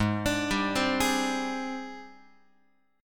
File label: G sharp Major 7th Flat 5th G sharp, down, Guitar; arpeggiated; x,4,5,5,4,7; 4A,4E,5A,5D,5G#,6C#; G#M7b5